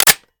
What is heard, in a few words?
ar reload fast empty bolt release; weapons; gmod; viper; scharlie